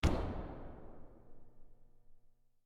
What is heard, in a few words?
reverberating slam